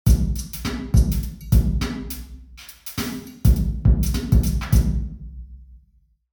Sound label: mine drum; Gill Heads mine; site 2; 2 way mono processed